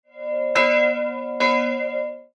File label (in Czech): zvon SMS 2x